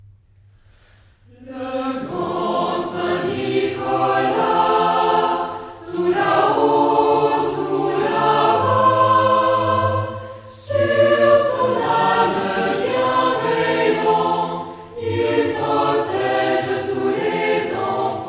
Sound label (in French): chant st-nicolas fr